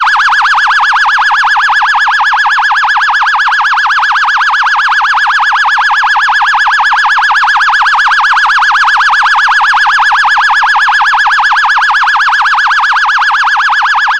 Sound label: police phaser siren